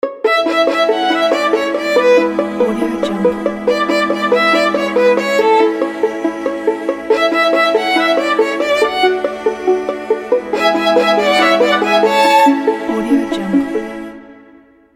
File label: happy violin and cello logo